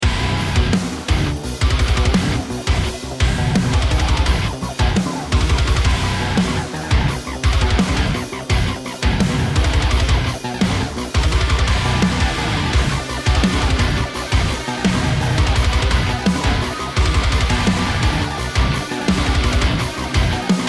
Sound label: start round, music